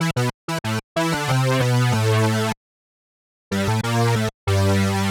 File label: Synth Line 94,hiphop samples; 94bpm; musicradar